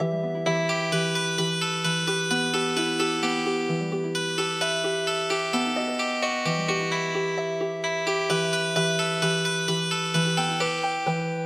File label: Healing Harp